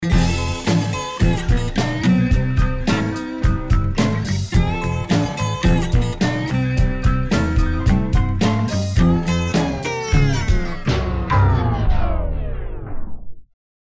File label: Blues Spindown; Traler Park